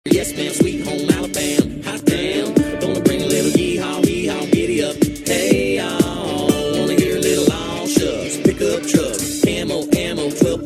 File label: country ringtone